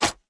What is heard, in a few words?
Inspect Open; Valorant; odin